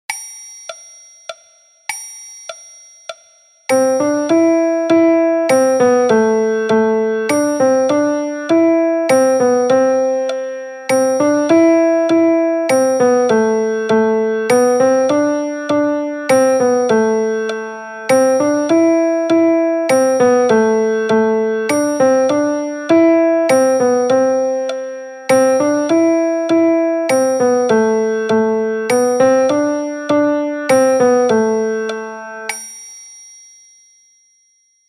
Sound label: Piano Version